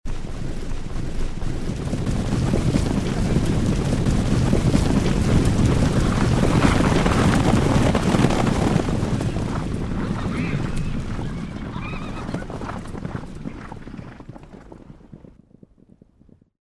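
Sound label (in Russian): табун бежит мимо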